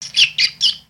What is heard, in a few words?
Pet Bunny Happy; creatures pets; Lego